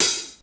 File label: hat; xr10